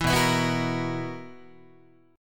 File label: B Major 7th Suspended 4th down Guitar; BM7sus4; strummed; 7,7,7,9,10,9